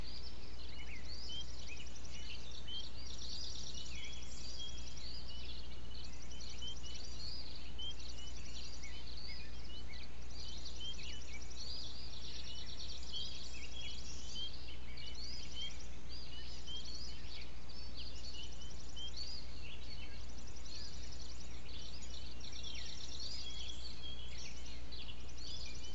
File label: birds